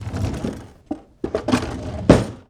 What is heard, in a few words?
Kitchen Drawer Open Close